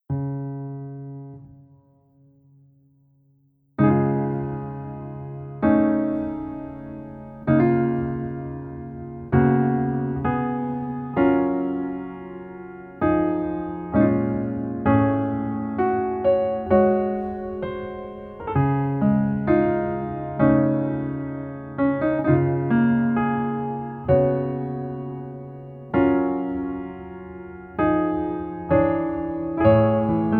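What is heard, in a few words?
Piano version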